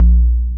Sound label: kicks, Bass Drum